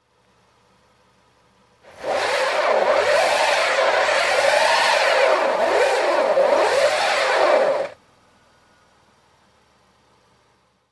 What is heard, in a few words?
Truck Winch pulled out